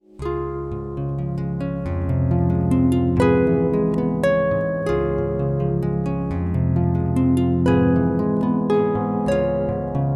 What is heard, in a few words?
ELECTRIC, harp